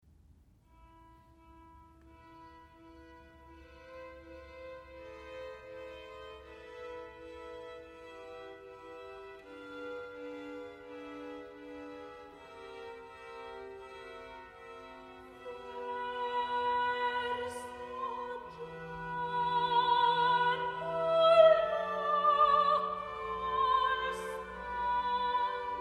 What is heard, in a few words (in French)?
Soprano, Voix de l'opéra; cartable musical